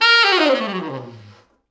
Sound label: T Sax falls